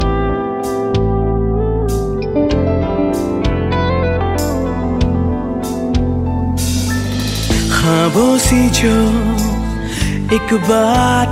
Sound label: ringtone bollywood ringtones